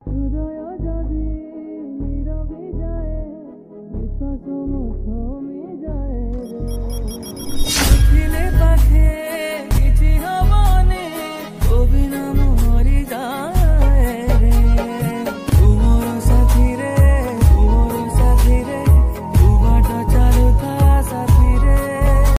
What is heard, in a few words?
Odia Ringtone